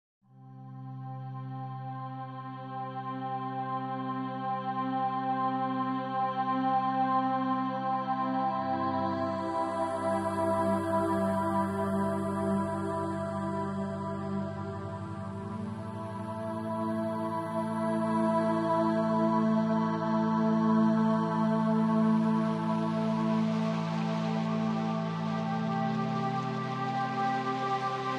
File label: Ambiente; OceanSunset Alpha